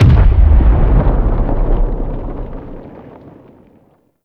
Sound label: Teralyst Far Step; Enemies; Steps; Warframe; Eidolon